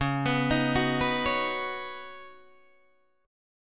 C sharp 7th Suspended 2nd Suspended 4th C sharp down Guitar DADGAD